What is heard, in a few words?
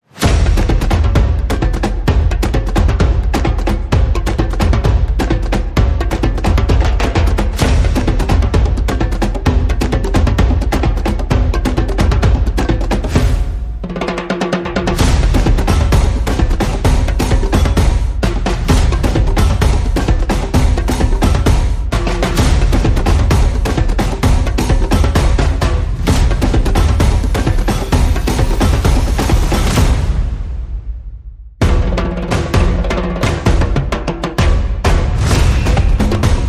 No Shakers